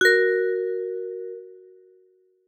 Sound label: sun start; photon path